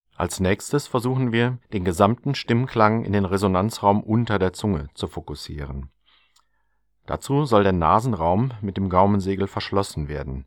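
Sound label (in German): Nasenresonanz zu und wegschalten; Buch Oberton Singen CD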